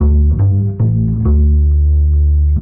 bass, Loops

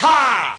"ha", Voice

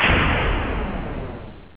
electric explosion; Crash Bang Boom

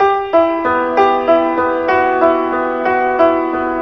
piano, Sad

